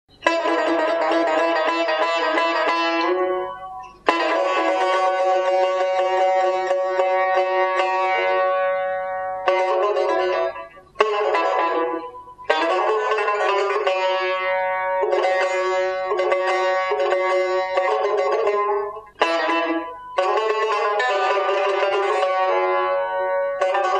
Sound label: Tar